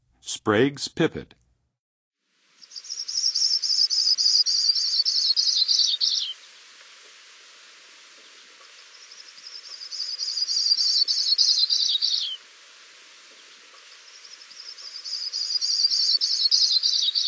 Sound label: Spragues Pipit, Songs of Birds; North America; Western USA; Western USA Songbirds